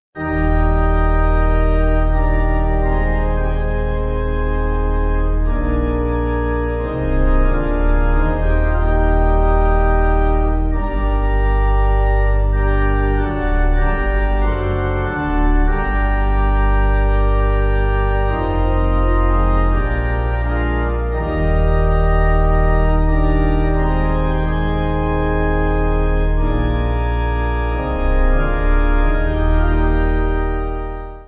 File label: Pipe